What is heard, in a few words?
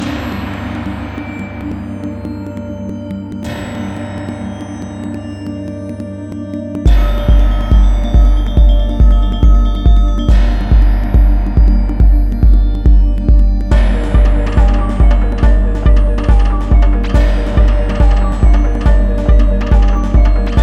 Gamelan techno Medium; rive wreck hack die retry; ps4